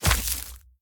Web Spawn; The Inn

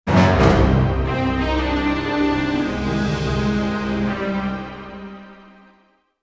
Game Over Jingle

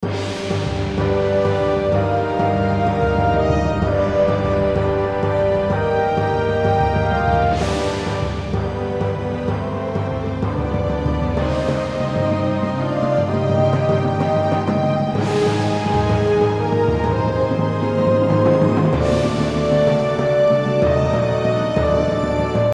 campaign victory; Zeus Master of Olympus

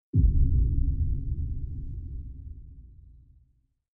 Background Sound

